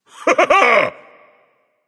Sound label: laugh; Smite; ymir skin14